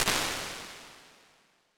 Long Snare, impulse responses, large spaces, rooms; Apple